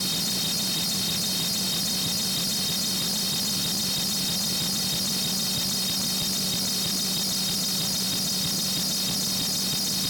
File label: Harsh Noise Feedback Loop; Glitchedtones